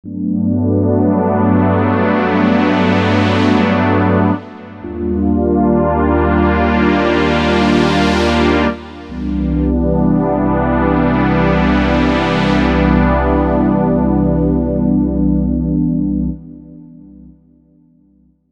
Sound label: Warm Pad; Minimax; dinopark